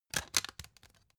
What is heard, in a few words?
cd in cover